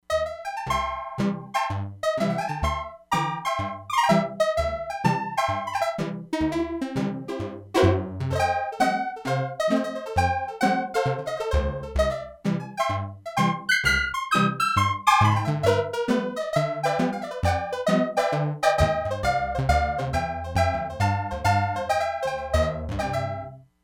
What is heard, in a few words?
HONKY TONK PIANO, Crumar Bit 99; Factory Programs